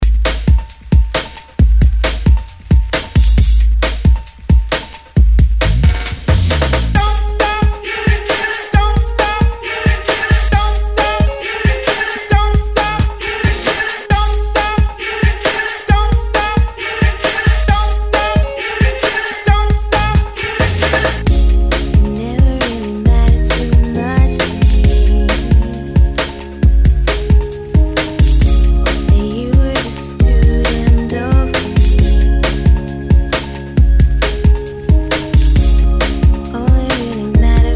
90s Hype Intro Outro 134 Bpm

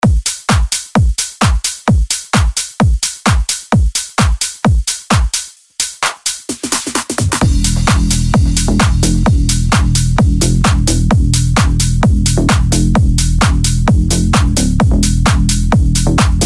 breaker 130 bpm

